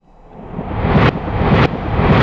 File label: noise fx; Masterbits; Switch FX